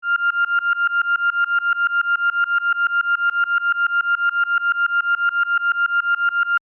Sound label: Alarm Spaceship, Scifi, Alarm, Spaceship, Console, Science, Fiction; Tech; Blast Wave